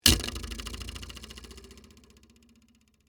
Door Stopper Heavy, Texture, Door, Stopper, Heavy, Movement; Miscellaneous Doors; BlastWave